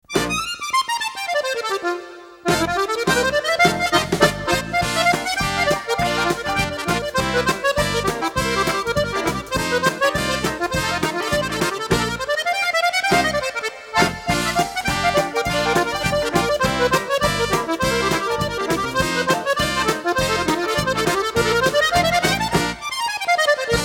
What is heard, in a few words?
Rag Musette